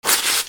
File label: PAPER